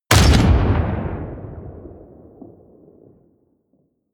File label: artillery medium close